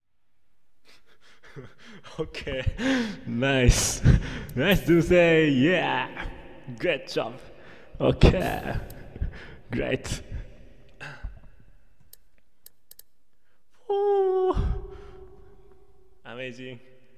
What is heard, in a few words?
applause; Doomsday v3.0 green; Toonzz